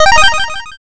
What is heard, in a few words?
powerup